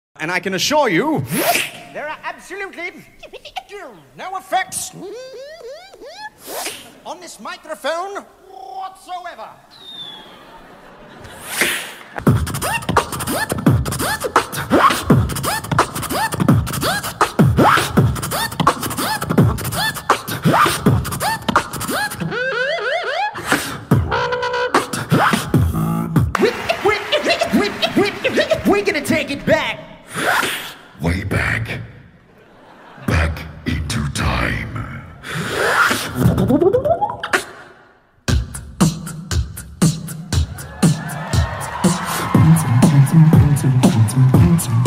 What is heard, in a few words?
beat box; بیت باکس